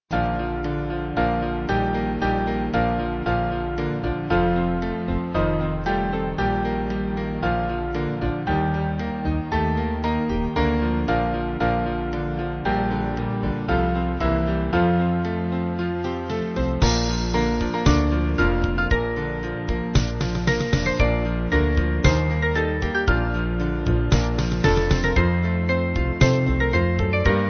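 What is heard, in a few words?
Band, Music Backing Files for Churches; Low In The Grave; Lowry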